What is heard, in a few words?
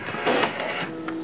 PRINT; EARTH